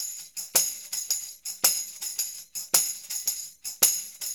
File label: 110 tam; Percussion